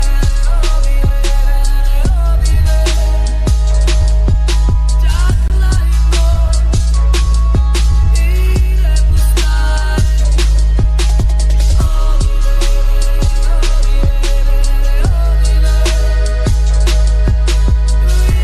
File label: english ringtone; english ringtones